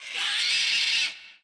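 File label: squawk, dactyl; Giants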